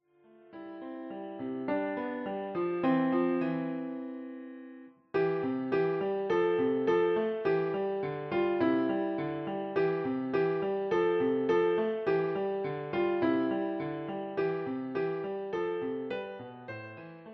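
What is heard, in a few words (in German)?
We Shall Overcome, Klavier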